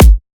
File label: drum kick klopfklopf; Virtual Riot; Drum Hits